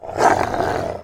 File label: Wolf alerted